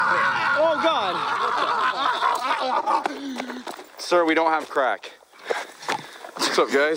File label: AHHHHHHH; Colby brock